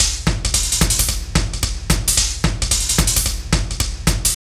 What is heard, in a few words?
Drum Loops